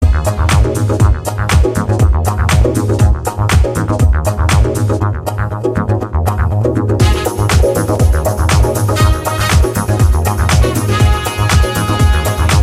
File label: flowing fountain summer love tone ringtone